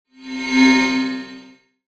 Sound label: Health